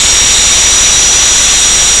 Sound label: Saw Running; After Dark 2.0 Modules